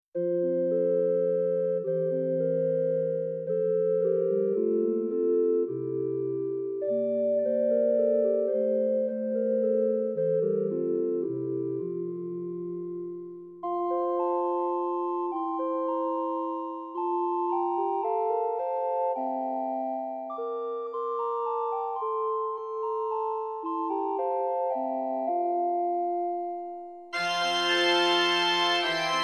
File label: Prelude